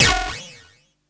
EFX, SFX KIT; Wizoo - Platinum 24 Electronic

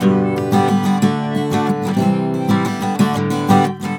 GTR6, Celtic Flavours; USB Soundscan vol.42; AKAI

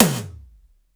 MONDO TOM; Crypton - DrumBase5000; METALIC DRM2

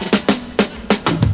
Fills, Roland; Sound & Vision - Gigapack I; Perc

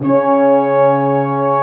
brass, C3; AKAI S-Series CD-ROM Sound Library VOL-2

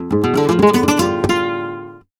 guitar f, Flamenco Remates De Pulgar; ProSamples vol.46 - Flamenco

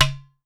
UTAR, Definitive Percussion

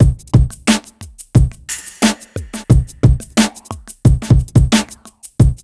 beat, hip hop; USB Soundscan vol.19; AKAI

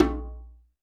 percussion, Roland TD-20

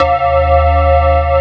METAL BAZZ

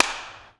CLAPS, SINGLE CLAPS; Best Service; World Colours

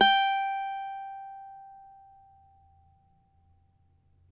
CAVAQUINHO G, Guitars; Spectrasonics; Hans Zimmer Guitars VOL-2

